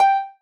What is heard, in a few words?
marimba rainbow; ゆめ2っき